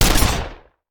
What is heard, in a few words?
Shotgun; Sound Ideas; Guns